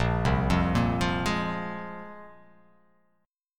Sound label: A sharp 7th Suspended 2nd, guitar chord; down